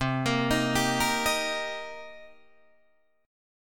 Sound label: C Minor 7th C Guitar Open D